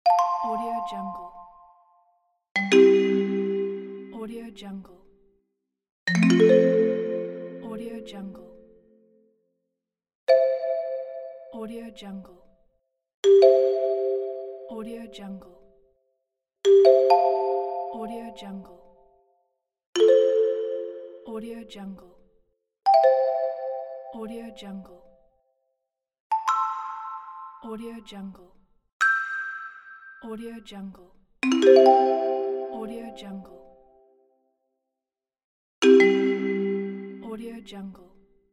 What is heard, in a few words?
text message pack